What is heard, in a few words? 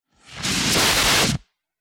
Short Heavy Fire Exti, fire extinguisher